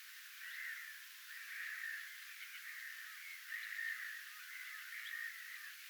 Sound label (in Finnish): tuollainen peipon kuin hyit-aani